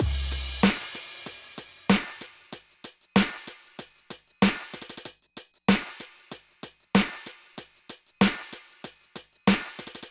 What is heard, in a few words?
Drums; AppleLoops